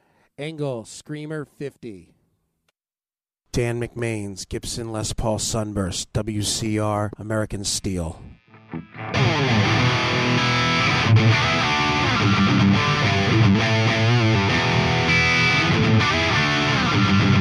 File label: engl screamer 50 bridge